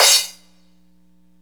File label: Crash, Cymbals